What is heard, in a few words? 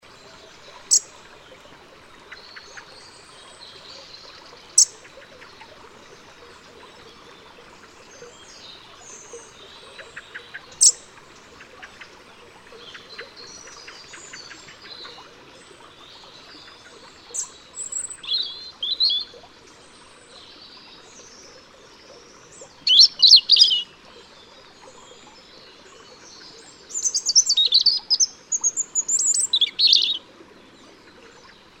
Erithacus rubecula; SETE